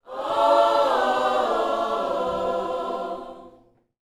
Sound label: Vocal Gospel PHRASE